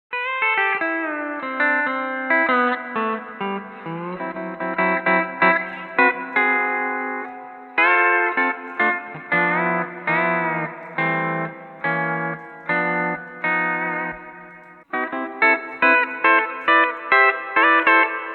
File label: Hall Reverb FX Loop, Combo Amp; Quilter Labs Travis Toy 15 200-watt